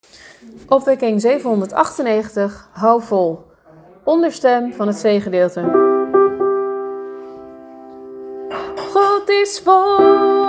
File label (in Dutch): lage mezzo alt; geluidsbestand onderstem refrein: lage mezzo’s en alten